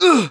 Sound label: wounded, English Voice; The Sum of All Fears